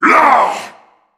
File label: shout